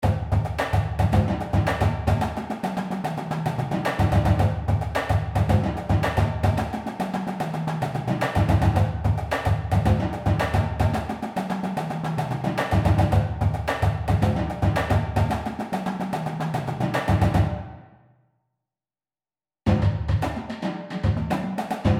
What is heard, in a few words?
Downs for Drumline